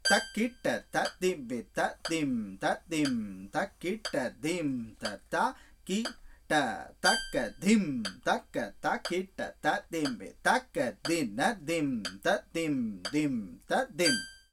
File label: nb phrase 4 7 tisra nadai